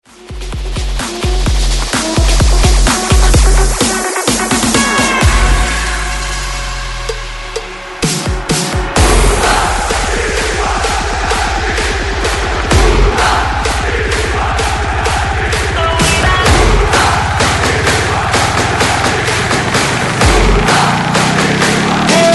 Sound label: 128Bpm BREAKMIX